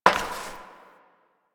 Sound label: Step Glass; Warframe; Koumei